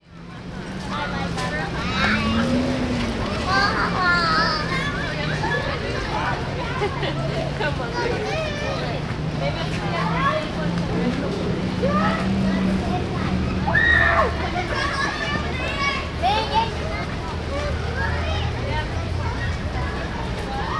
Downtown Houston Discovery Green Playground Ambient